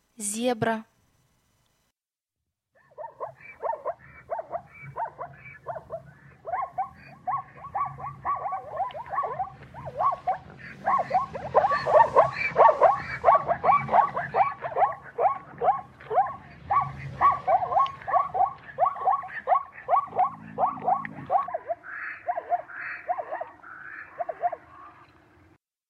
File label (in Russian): Зебра